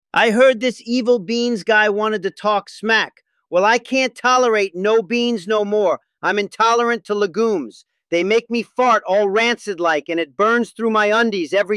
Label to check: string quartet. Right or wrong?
Wrong